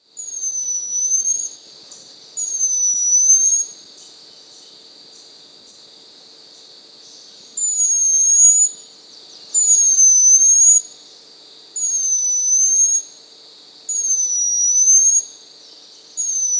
Shrike-Like Cotinga (Laniosoma Elegans), Andean Birds; S. America